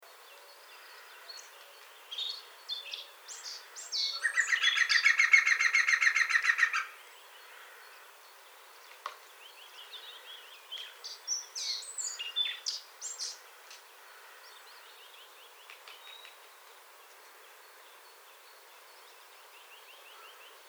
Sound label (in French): pic vert chant; PIC VERT CHANT perche bricolage; Le Pic vert travaille au nid